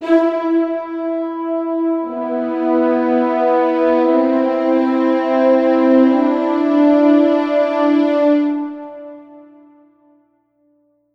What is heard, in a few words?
Music Stings